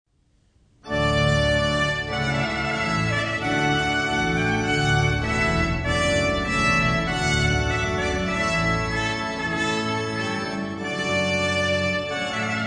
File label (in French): Trompette